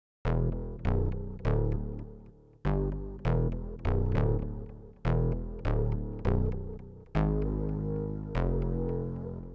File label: Bass, 100 G